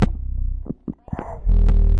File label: 120 svank bassblast